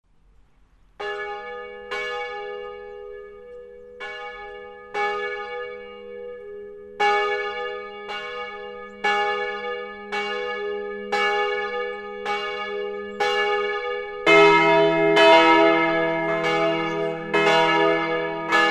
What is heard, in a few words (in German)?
Glocke E GIS; St. Nikolaus